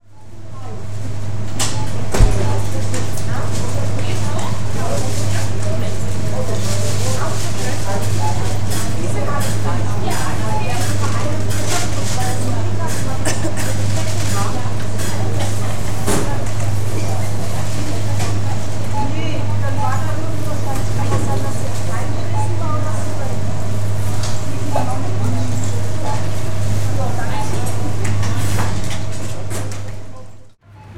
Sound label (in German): Atmo Vergleich verschiedener Rekorder